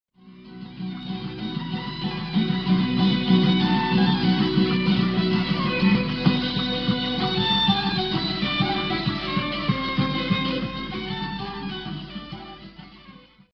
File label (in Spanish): violin; tradiberia